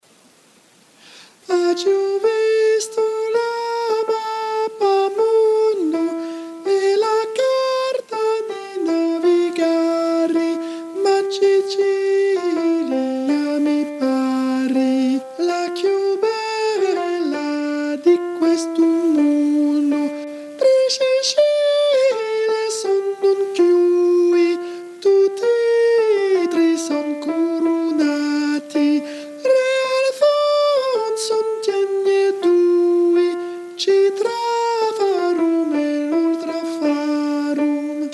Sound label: soprano; aggiu vistu lu mappamundu